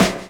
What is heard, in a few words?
DRUM CHOP; AMG Gota Yashiki Groove Activator